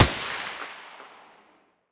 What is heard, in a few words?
muzzle M249 mono distant